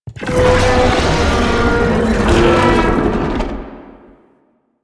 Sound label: Tank Infested Vocal Idle; Enemies; Barks; Warframe; Lasrian